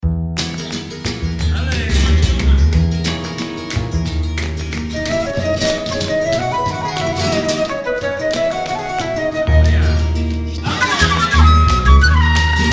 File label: tangos, flamenco flute